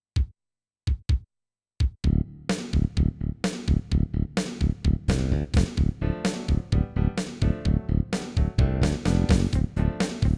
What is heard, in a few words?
SC880; the incredible machine 2